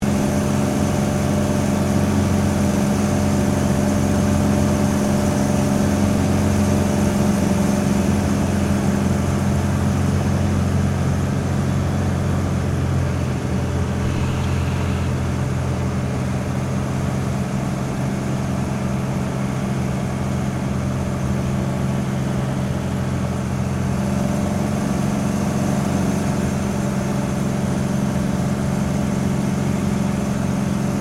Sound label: AIRCRAFT CT4A APPROACH INTERIOR, military trainer aircraft; Airplanes (Sound Effects:Vehicles)